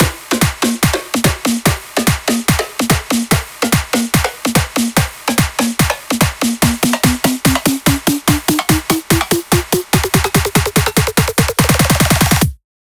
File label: Buildup Drum, 145 BPM; EDM; Drums; Loops; Cymatics; EDM Starter Pack